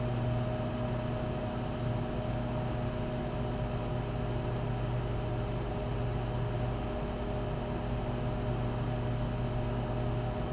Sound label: Microwave, Interfering noise source; TS 103 504; ETSI